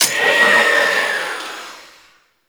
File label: Grimoire Vulnerable Impact; Enemies; Warframe; Entrati Doppelganger